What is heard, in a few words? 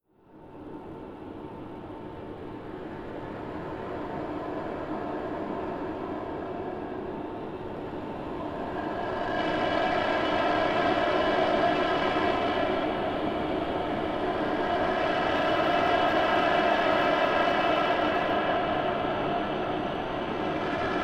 Howling